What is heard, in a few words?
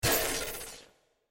Ice; Qiyana